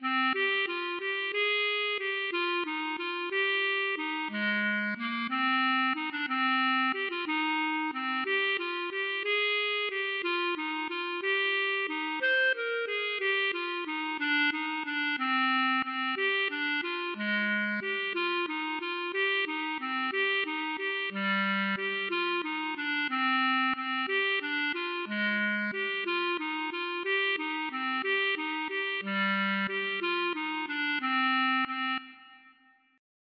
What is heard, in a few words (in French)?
valse